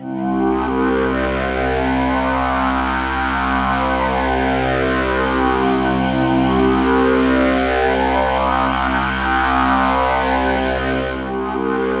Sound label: DRONESW, BIG SWEEP; Masterbits; Vintage Voltage